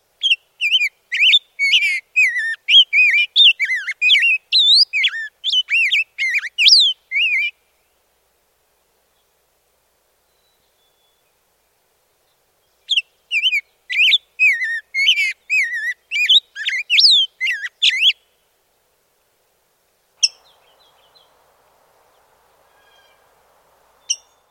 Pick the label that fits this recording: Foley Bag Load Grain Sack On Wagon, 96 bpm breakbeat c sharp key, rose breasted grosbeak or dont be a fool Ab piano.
rose breasted grosbeak